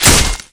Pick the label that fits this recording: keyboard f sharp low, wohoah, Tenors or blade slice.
blade slice